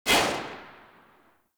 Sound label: Rifle Shot C; Tenno; Bard